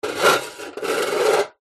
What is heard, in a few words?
metal box small empty slide scrape concrete; zapsplat; foley